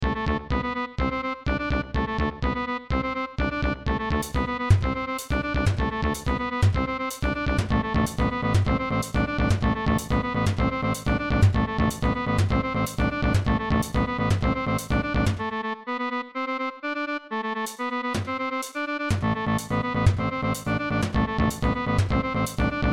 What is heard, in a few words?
Buildup